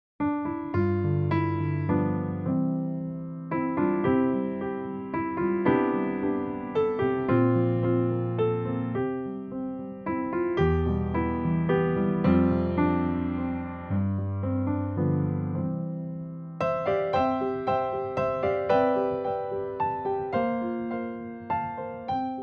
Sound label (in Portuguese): Parabéns (Backing); jardim infancia